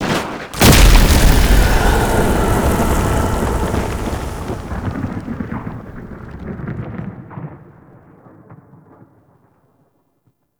Exit Door; Duviri Murmur; Oraxia

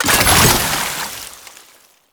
Alchemy Grenade Deposit Cold; Entrati

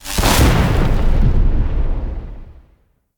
Super Jump Launch; Characters; Enemy; Nimrod; Abilities

